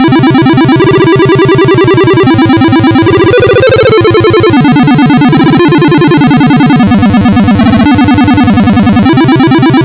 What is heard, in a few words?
pc arpeggio